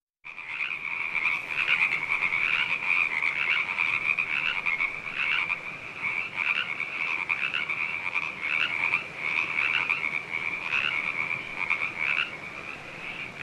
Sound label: frog chorus